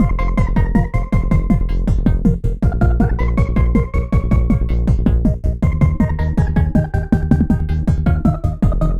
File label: track game over